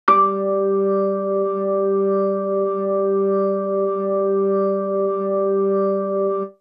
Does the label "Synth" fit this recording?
Yes